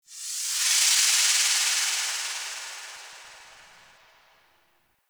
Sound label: Energy Thread Curve One Off; Warframe; Apostasy